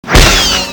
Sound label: sword hit; weapons